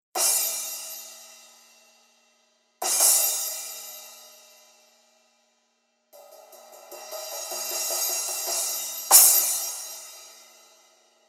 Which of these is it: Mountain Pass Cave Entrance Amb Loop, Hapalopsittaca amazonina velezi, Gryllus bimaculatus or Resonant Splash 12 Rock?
Resonant Splash 12 Rock